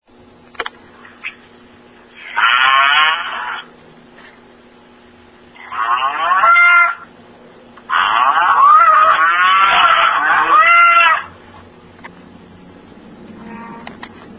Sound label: cows